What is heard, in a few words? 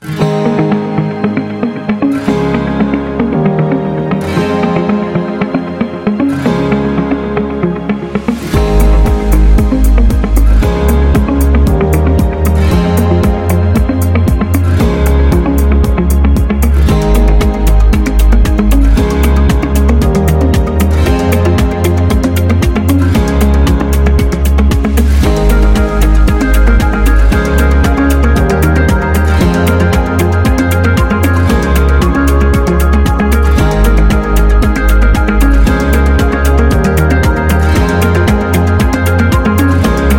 Gentle; service business